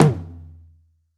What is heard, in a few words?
TOM, Jungle; Gorge Sample Pack Vol.1; San Gabriel